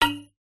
impact fluorescent light bulb no smash; zapsplat

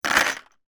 industrial small screws put in empty plastic box

